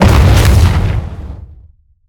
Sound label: Mortar Cannon Fire; Evolve; Behemoth